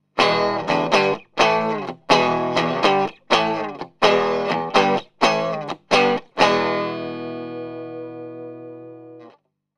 Magic Pre Strat Amp ME64 color; Fredenstein

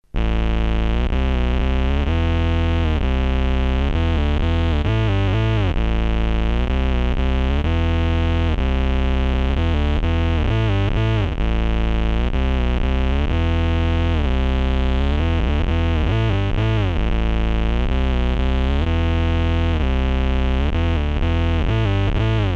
lowdown

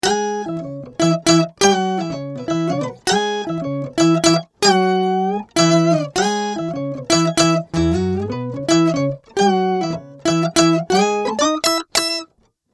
Octaver; Micro POG

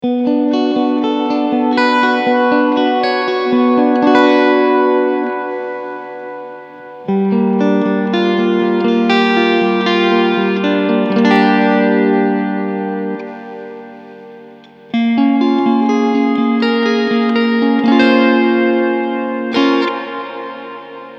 Reverb

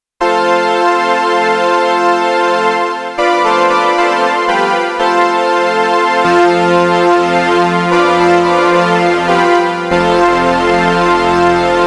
STREICHFETT

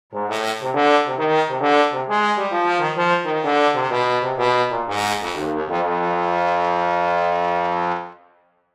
Solo Trombone

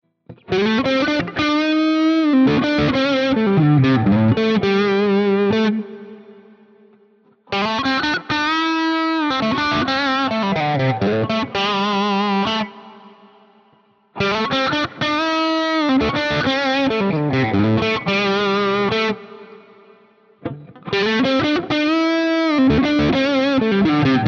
Guild crunch blues lick all positions

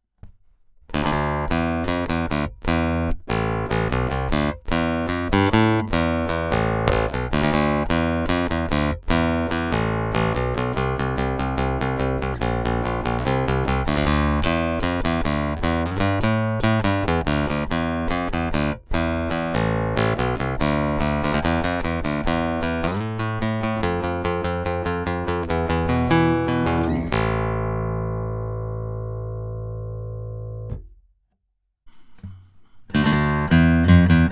Robot 4 Pick

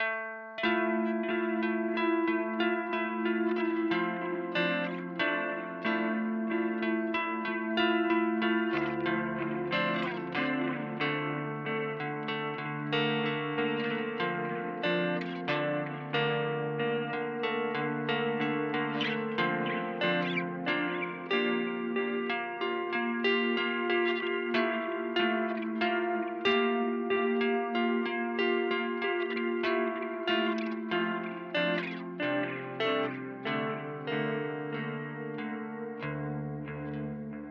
Simplifier DLX Stereo FX Loop; Specular Tempus

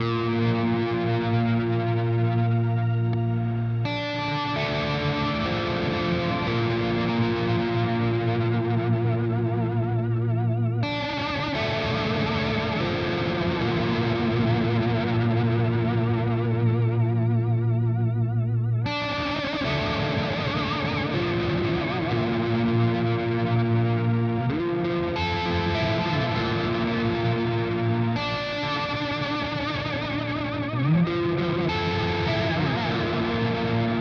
Astral Destiny Astral; Earthquaker Devices

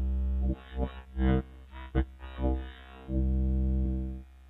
spectraphon vocal sammed; Make Noise

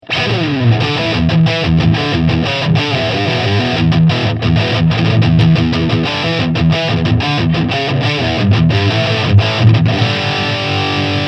Heavy Crunch Heil PR40 PR35 Celection 65 Watt

